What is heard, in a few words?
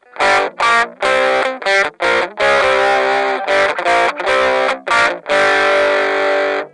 ms2 drive